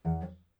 string; abstract; Free Sound Assets

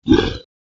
monster, creature

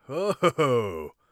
amused, Warrior Voice; Warrior Voice Pack

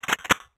Retro Weapon Reload

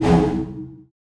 Hit Surprise; FREE SFX PACK VOL 1

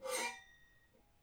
slash, weapons

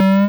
square signal; Square Waveform sfx

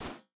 Explosion; Attacks

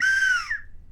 Quick High, Slide Whistle

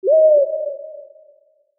Retro Owl; Animal Insects

